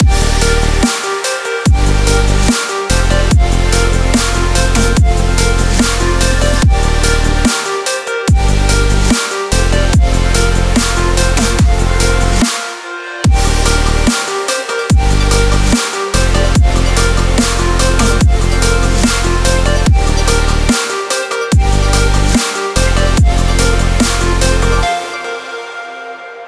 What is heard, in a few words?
extreme energy burst